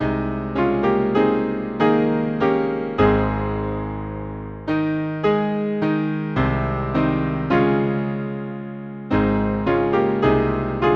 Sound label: Hymn, piano; Rise up ye saints of God; Festal Song